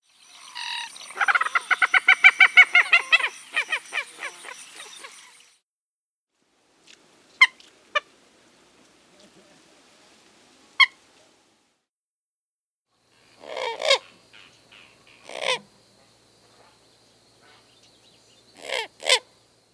Common Moorhen

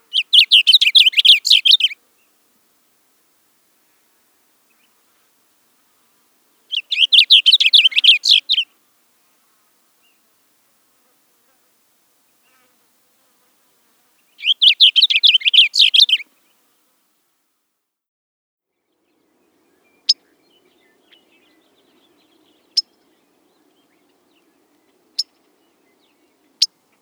Blue Grosbeak

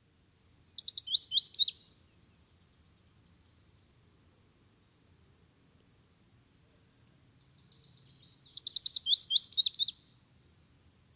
Lazuli Bunting

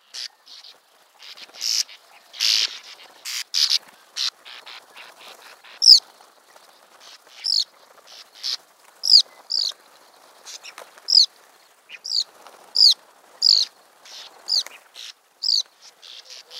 Bank Swallow

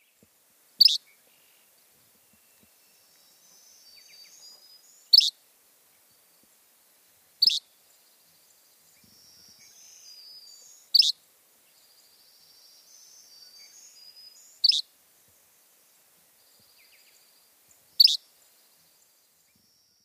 Yellow-bellied Flycatcher

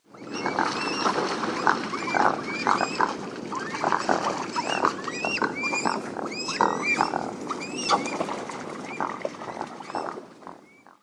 Northern River Otters

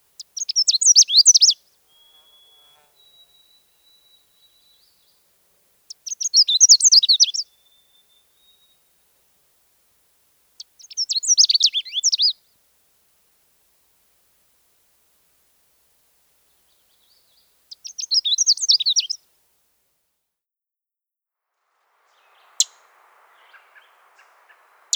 Canada Warbler

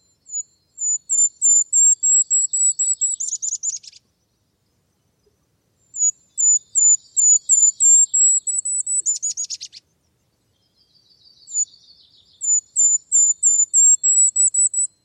Golden crowned Kinglet

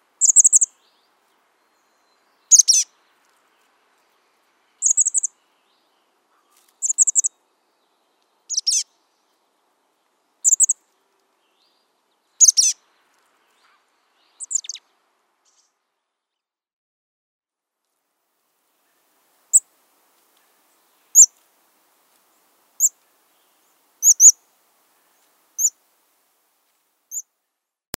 Chestnut-backed Chickadee

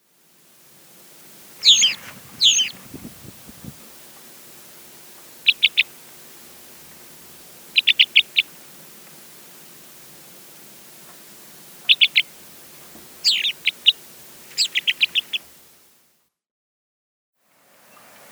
Black Rosy-Finch

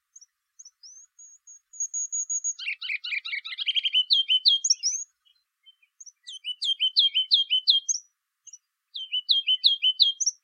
Rubycrowned Kinglet

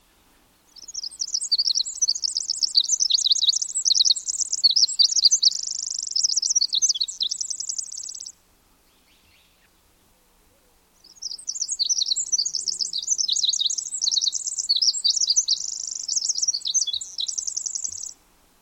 Pacific Wren

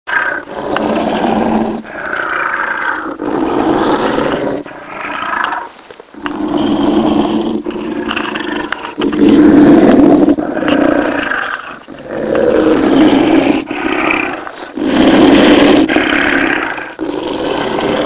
Wolverine

